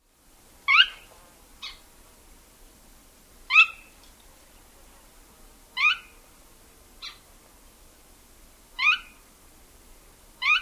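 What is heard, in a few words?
calls, phainopepla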